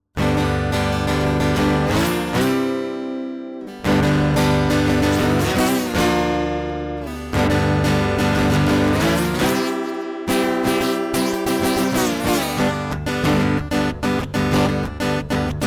slide guitar

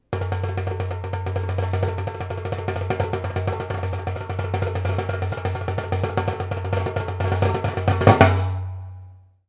drum

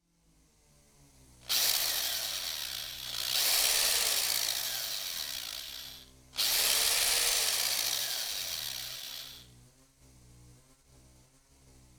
drill; Screw It

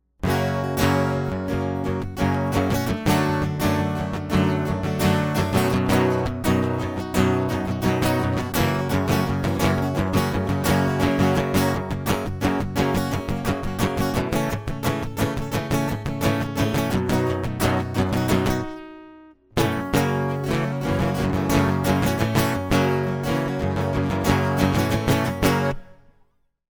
guitar; I Scream

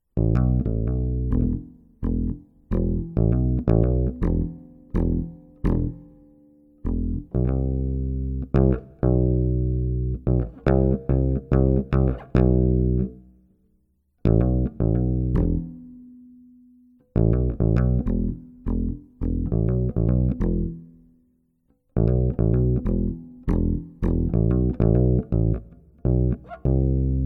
bass

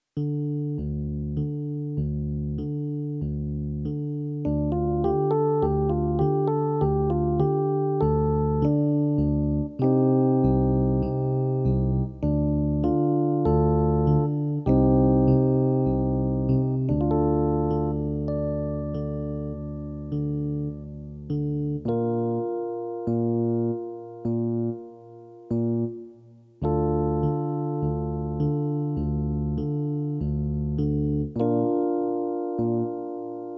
electric acoustic

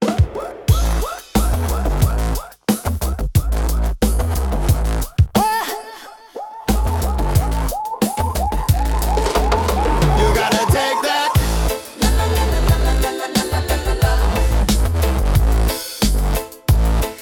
Reggae

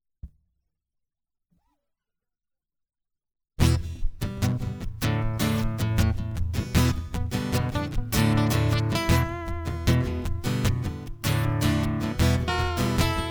Guitars Of Summer

